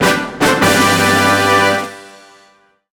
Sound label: Darts Jingle; Hatsune Miku Project Mirai 2; 3DS